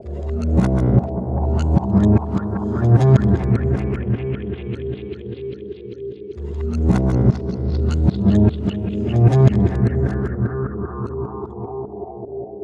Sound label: Remixed Acoustic Bass; Sonic Foundry; Leo Cavallo Bass Taster